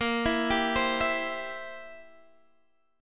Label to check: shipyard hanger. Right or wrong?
Wrong